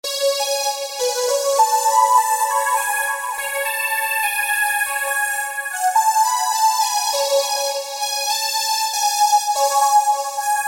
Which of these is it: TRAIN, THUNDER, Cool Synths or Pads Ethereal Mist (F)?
Cool Synths